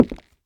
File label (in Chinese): 下界木踩踏声